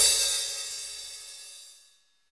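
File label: cymbal, Roland; Club-50 - Foundations; Thin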